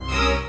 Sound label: Scary Psycho Monster